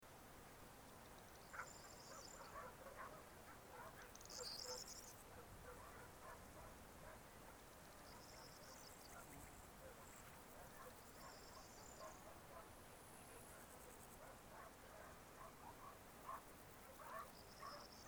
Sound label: Kaskelen Gorge